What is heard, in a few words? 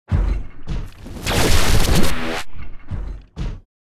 Hacking